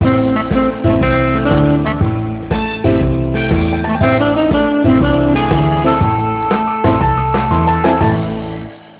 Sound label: late nite sax; Nokia; 6630; muvee